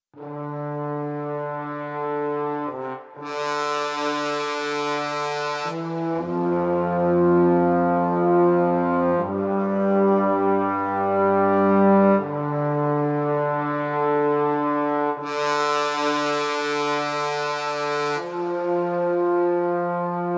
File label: horns